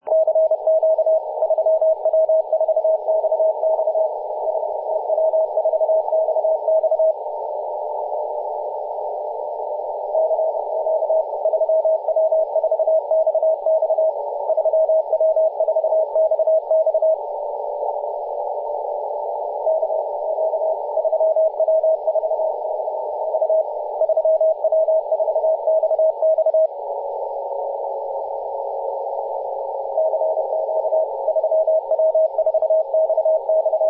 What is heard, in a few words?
14.022 MHz cw